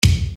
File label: Bass Dr, EuroDance Bdrums